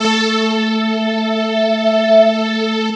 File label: Dream Pad (single note)